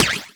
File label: karateman offbeat; thirtydollarwebsite